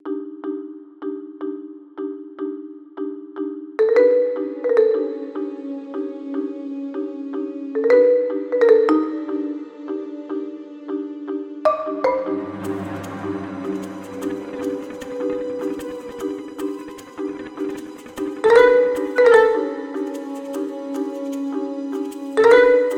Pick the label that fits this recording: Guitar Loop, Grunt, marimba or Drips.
marimba